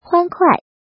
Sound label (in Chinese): 欢快